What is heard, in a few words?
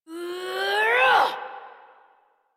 Exertion Adult Operator; Warframe